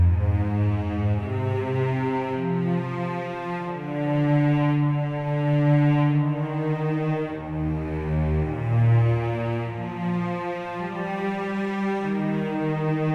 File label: Cello; Toki Tori 2